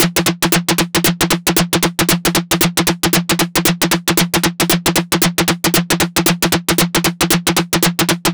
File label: 115, Digital Breaks; Loopmasters; Jon Carter & Alex Blanco; Block Party Essentials